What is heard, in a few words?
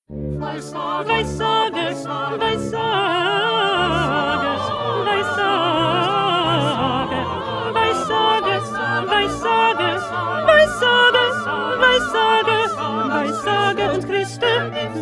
2nd Alto